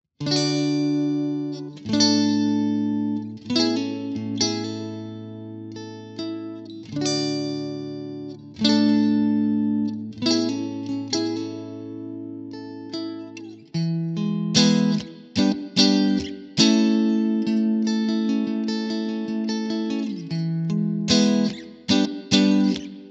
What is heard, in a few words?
clean; jensentone